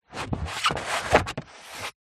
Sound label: foley paper thick sheet edge scrape on table